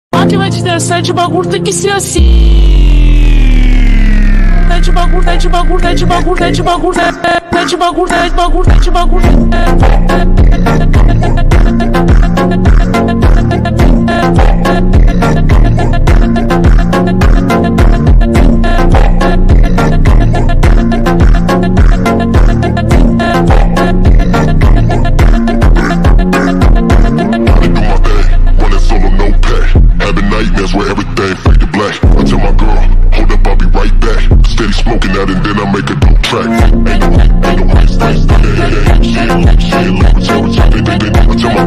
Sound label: Phonk Slowed